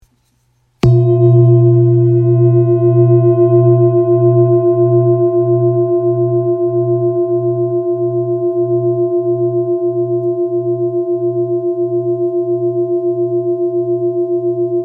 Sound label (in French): Bol chantant thérapeute 1.165kg si